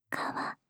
川, ASMR; シャッフル睡眠ランダム再生用; 無声音ASMR; シャッフル睡眠法で眠ろう; バイノーラル音声

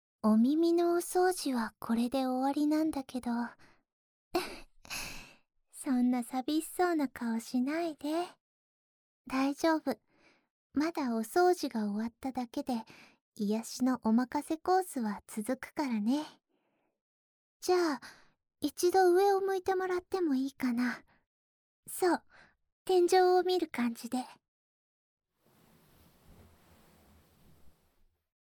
お耳マッサージ; もっとリラックスしてくれたら嬉しいな; キョクドー耳かきIどるず 花岡結～耳剃り耳かきマッサージでゆっくりまったりリラックスタイム～

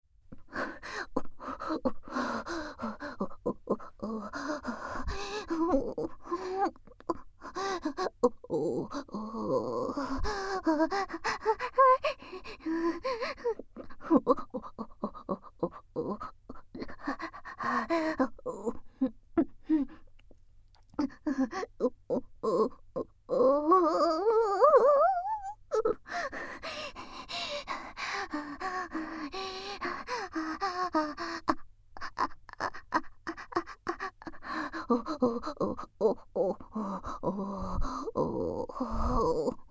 密着ガチオホ吐息喘ぎループトラック, ASMR; メスガキ魔法少女サーリャちゃん

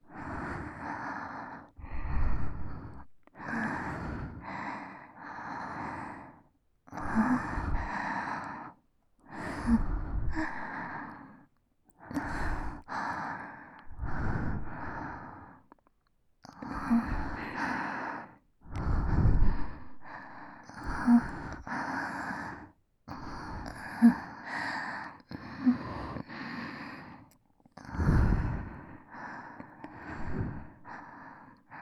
吐息を両耳同時再生; 生意気ナツキはナツキに勝ちたい! ～夢の中でも勝手にイっちゃう弱くて強気なボーイッシュ×2～

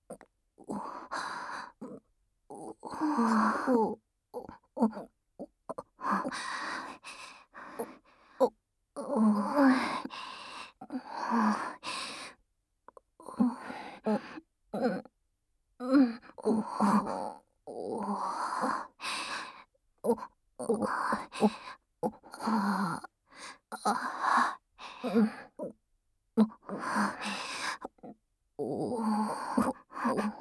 サキュバス姉妹のWオナニーゆるオホ喘ぎループトラック, ASMR; ダウナーオホ声WサキュバスJKと激狭ワンルームの生活音垂れ流しだらだらえっち同棲

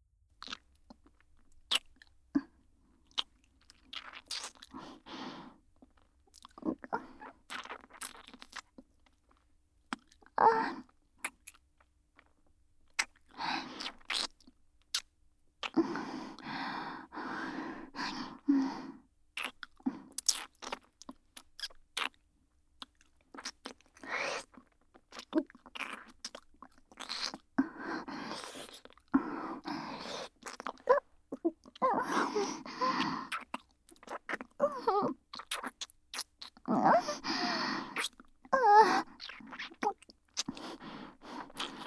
ベロチュー喘ぎループトラック, ASMR; フォーリーサウンド; 他人に興味ゼロな美少女クラスメイトが『事務的妊活パートナー』に選ばれた話

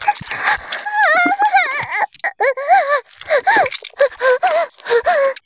絶頂 中出し; 騎乗位

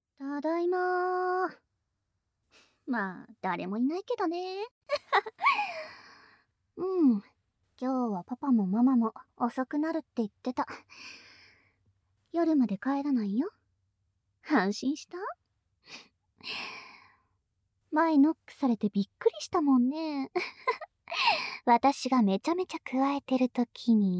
耳舐め ねっとり 手コキ

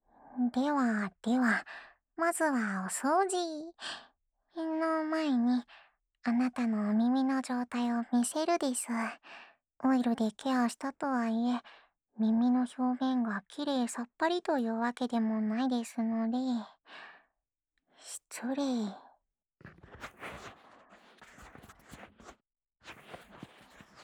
全力お耳かきです（右耳）, ASMR; 獣の魔女; ウイッチズ・エルミタージュ

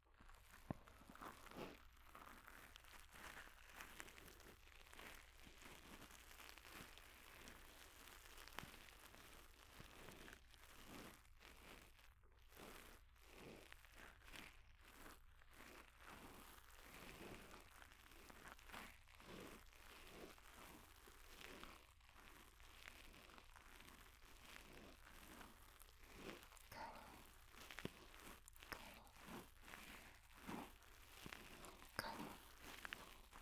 耳かき ふー; 耳かきセレクション オノマトペ式ASMR; 睡眠導入; 安眠ASMR

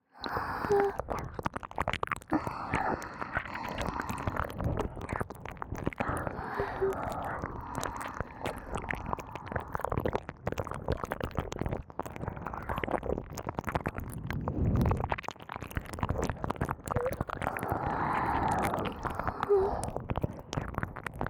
耳舐めループ; おま〇こで町おこし!生ハメし放題なおま〇こ付き物件に移住しませんか??

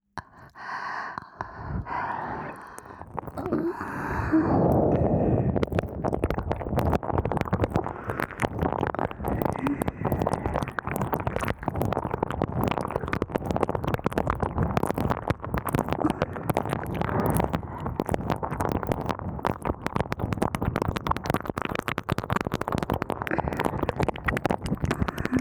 両耳舐め 両耳 グポグポ; 耳犯; 【耳舐めプレイ特化】耳犯2〜とろっとろ両耳舐めで絶頂快楽〜

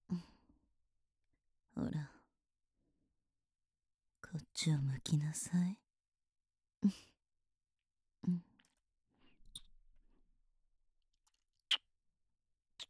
キス; マゾハーレム

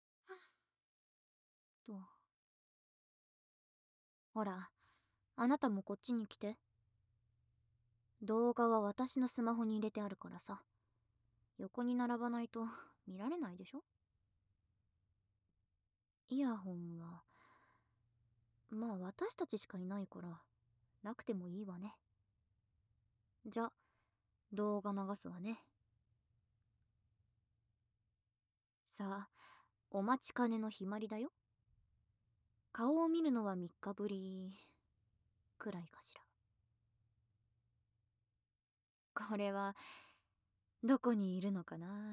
実況 イラマチオ; SE有り

